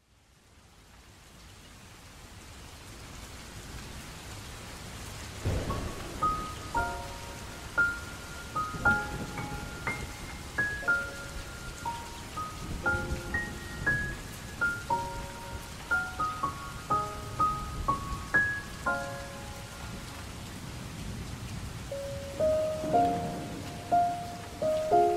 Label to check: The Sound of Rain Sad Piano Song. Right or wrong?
Right